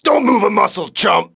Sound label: hat